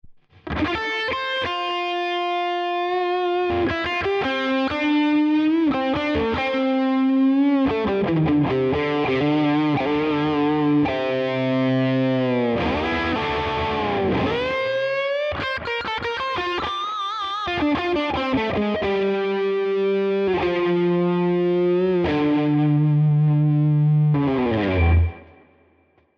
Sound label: Tone King Imperial MKII Lo Gain Lead